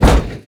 impact metal medium

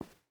footstep hide carpet walk; Rust

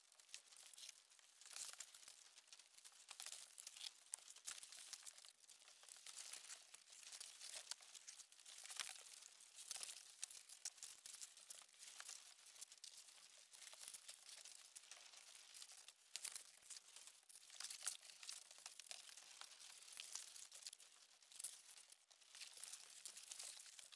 ambience twigs busy; Rust

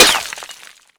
plaster small

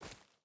footstep barefoot forest walk; Rust

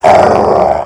dog growl

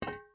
ui drop metal hollow large; Rust

